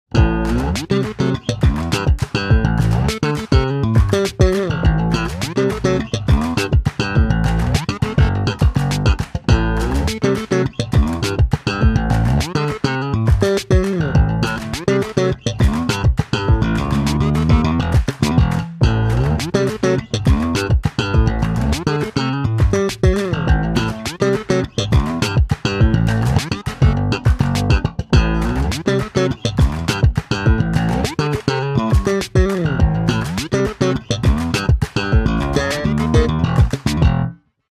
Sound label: Slap time